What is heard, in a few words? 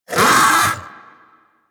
Vocal Pain E; Warframe; Hunting Critters; Vulpaphyla